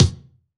BD, BASS DRUMS; EdgeSounds

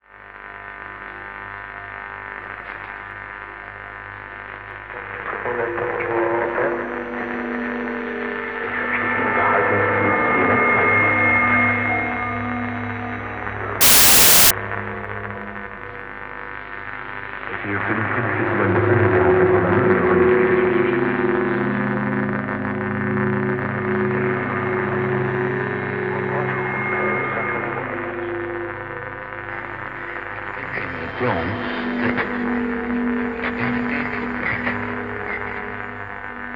RADIO IN SPACE; Zero G; Malice In Wonderland

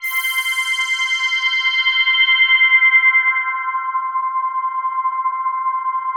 RICH HARM; Greytsounds - Scott Peers Sound Engineering

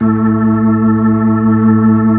B-3, Full Xfade

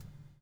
BUBL, Backbeat; Spectrasonics - Backbeat

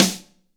SN AMB, AMBIENCE SNARE

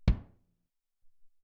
Kick, Alesis SR-18

